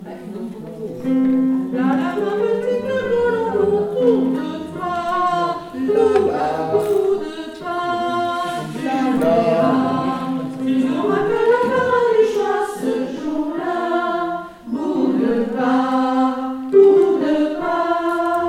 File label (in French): soprani fin, chorale